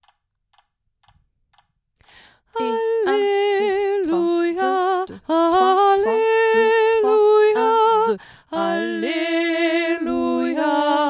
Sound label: O Magnum Mysterium Alleluia Basse Alto